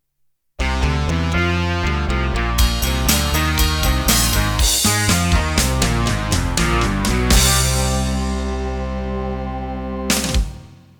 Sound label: Open Rock 8 End